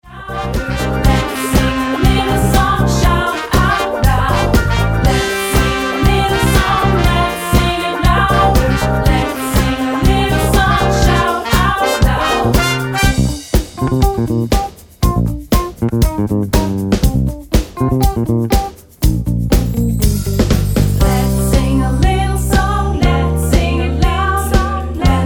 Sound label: GROOVE VERSION